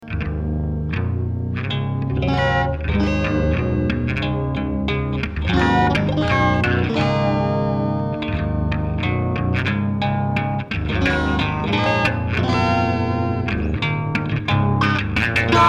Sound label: zipper loop